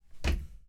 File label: Rock Knock